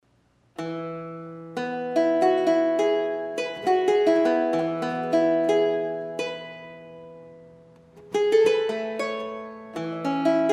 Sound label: durezze e ligature, harpsichord; salvatore